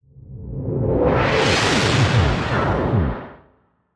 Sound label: digital broken swell; SoundsCrate